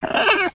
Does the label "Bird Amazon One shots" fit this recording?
Yes